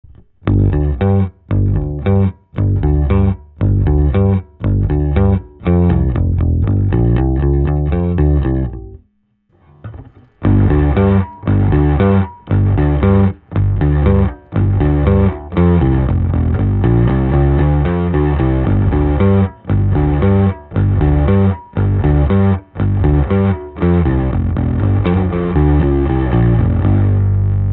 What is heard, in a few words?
TC Nova Drive; overdrive fuzz